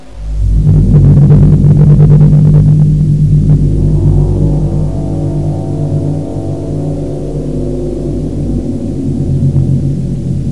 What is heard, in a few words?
thumper alert bgm; lethalcompany; zerosiki; slender